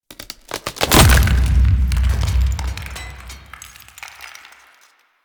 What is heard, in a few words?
Head Crack; Erra; Dax; Warframe; The New War; Lua War Room